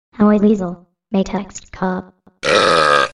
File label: Burp